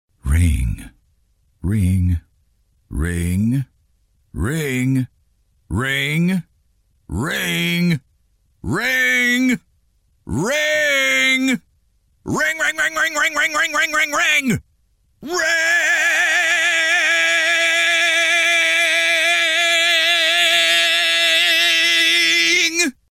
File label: Ring Ring